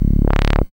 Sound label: SID Bass C#, chip; CHIPSHOP HITS